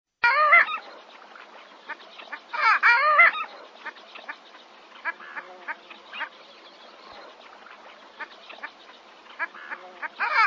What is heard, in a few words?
Long tailed Duck